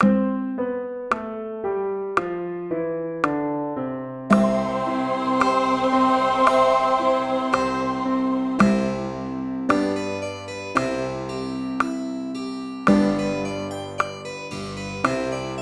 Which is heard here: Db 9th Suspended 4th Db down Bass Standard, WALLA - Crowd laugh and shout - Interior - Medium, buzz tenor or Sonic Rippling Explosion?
buzz tenor